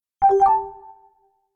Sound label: Nokia Message; X100